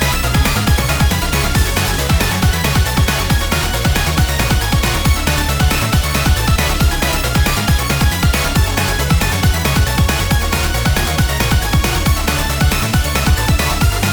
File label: Challenge stage Loop